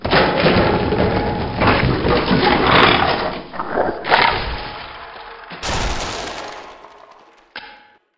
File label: zombies break in